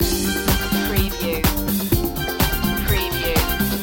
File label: disco loop